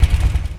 challenger engine stop

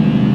talon loop; gdi

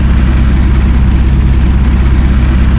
black eagle idle

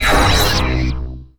striker fire

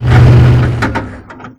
ht stop

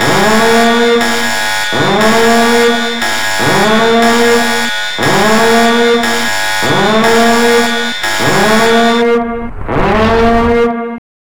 nuke strike buildup

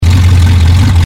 raider idle

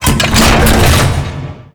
scorpion reload

